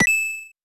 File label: Super Jump Coin Hit; Mario; Super Smash Bros